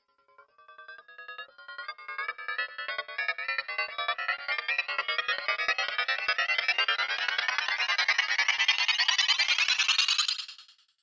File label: Time Travel Space Whoosh; Whoosh Sound Effects